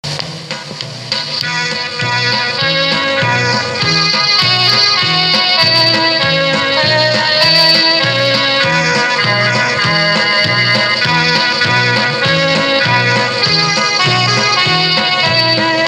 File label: Love Divine All Love's Excelling Sung; Beecher; Cassette